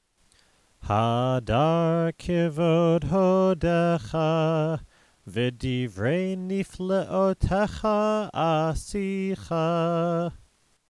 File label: ashrei